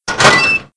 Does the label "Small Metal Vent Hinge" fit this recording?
Yes